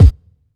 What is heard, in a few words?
boomy kickdrum sound f sharp key